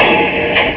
Pile Driver; Odyssey

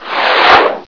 sword light; Net Power 1999 #12

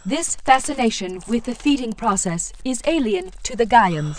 banquet; Babylon 5

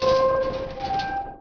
DOOR; Hidden & Dangerous; h&d demo

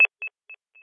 launch

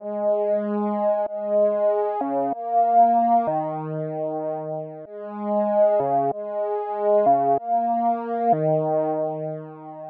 strings pad; bombogroove

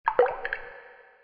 sewer drop; Grounch

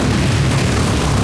Fire; breakout; farm

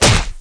SW BODY; thief

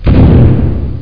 ball; abuse

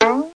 Boing; SoftKey

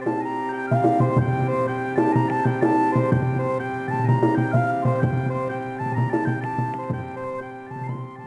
city jig; DAoC

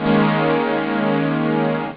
nuke, synth

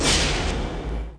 Eye Jump; Macworld (1997-11); Games World; Shareware Games; Arcade; Peng! 1.0

